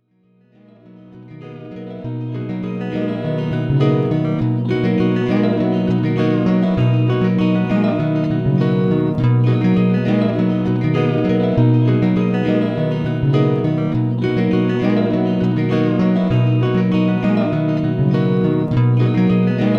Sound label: loop